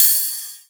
Ride, Cymbals

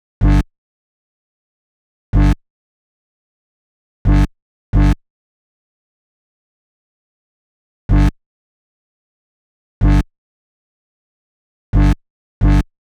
125 G Bass, Complex Electro Synths

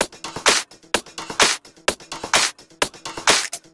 Loop, 128 BPM

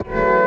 B STAB, funky; Loopmasters; Funky Underground Vol 2

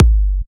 Bassdrum

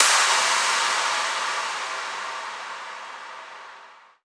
FX Impact

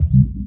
Eb STAB, funky; Loopmasters; Funky Underground Vol 2

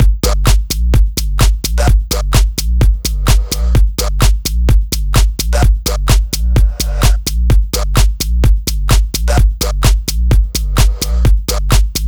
Techhouse Mix; Mutekki Media; Vengeance Electroshock Vol.2

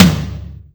Toms

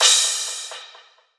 Crash, Cymbals

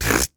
Human Beatbox, Beatbox Hits

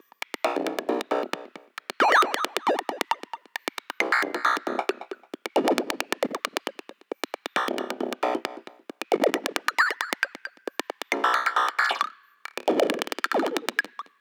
Bpm135 Perc Loop; Randomness; Dubstep

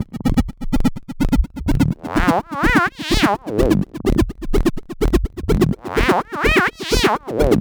126 E BASS, Funky; Loopmasters; Funky Underground Vol 2; BASS LOOPS

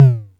PERC; Loopmasters; The New Sound Of Dubstep; DKD DRUM HITS

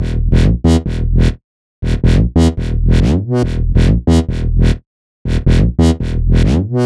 140 E BASSLINE, Dubstep

